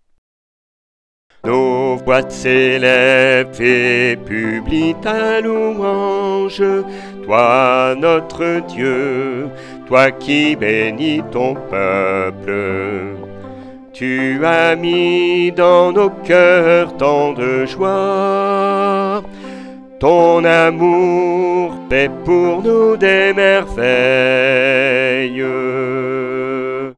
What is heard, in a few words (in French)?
BASSE